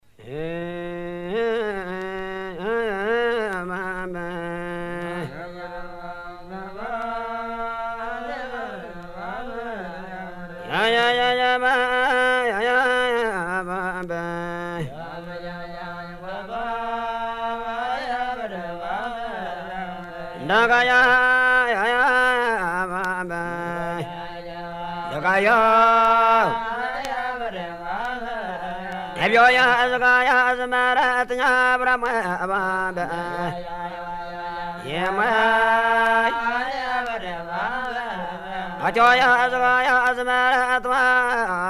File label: Entooro, Hima, uganda